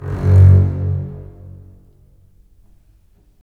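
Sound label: STR, strings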